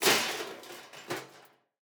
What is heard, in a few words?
metal break